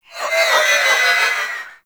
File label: Sticky Ink Vocal Call; Gameplay; Mutator; Warframe; Shadowgrapher